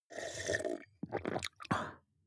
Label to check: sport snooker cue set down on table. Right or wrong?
Wrong